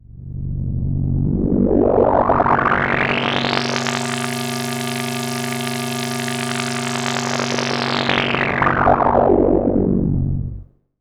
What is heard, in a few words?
Odd Modular; radiosonics